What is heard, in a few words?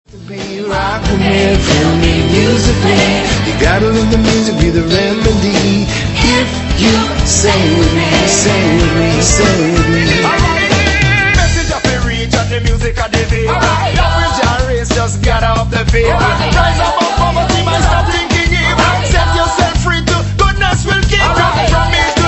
music